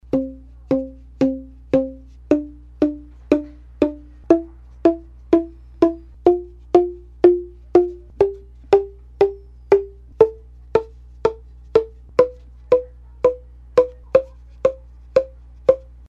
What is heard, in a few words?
diatonic, boomwhacker